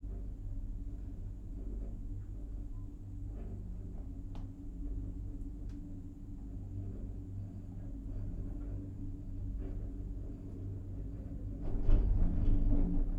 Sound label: AMBISONIC A INT Gondola Cab Ride Shiv Wheel Bumps, Ski Resort Cab Carts & Terminal; Coll Anderson; AMBISONICS A